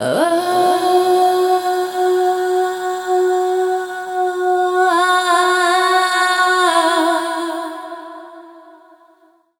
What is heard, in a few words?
Dance Vocals, FEMALE